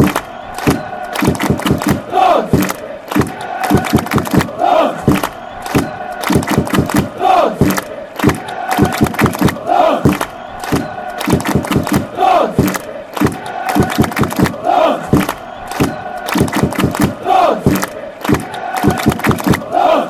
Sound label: clap; dons